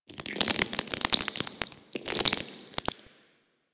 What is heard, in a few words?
Infested Ancient Taunt Flesh; Warframe